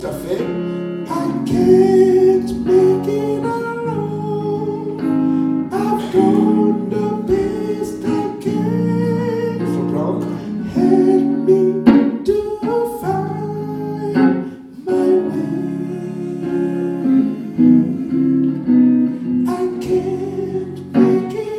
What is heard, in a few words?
soprano